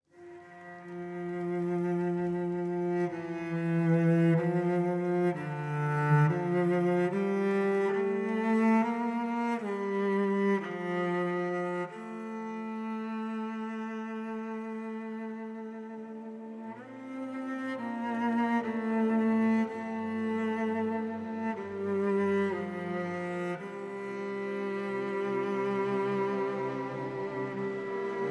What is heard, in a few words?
cello example